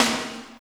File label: SD, Drum & Bass, 160 BPM